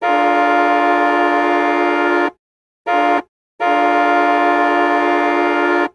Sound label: Hockey Goal Ottawa; Hockey Horns & Buzzers